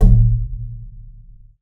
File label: BOW PERC, BASS FINGER; Xsample VOL-5